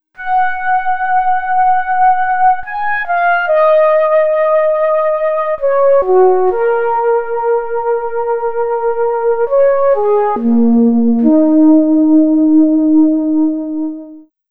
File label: Synth Flute; Sonic Foundry (Sony Creative Software); Slow Silhouette